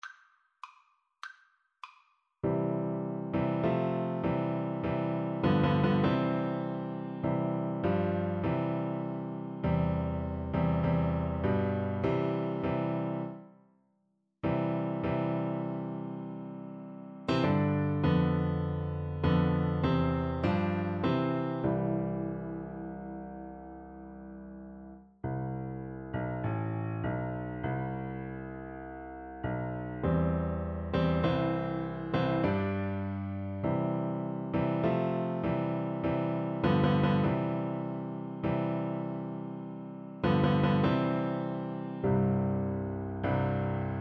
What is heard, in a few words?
chinese anthem, Piano Duet